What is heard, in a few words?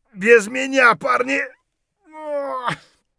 hurt near death; Escape from Tarkov